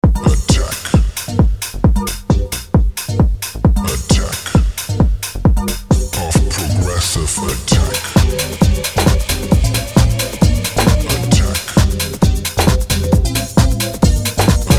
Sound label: progressive house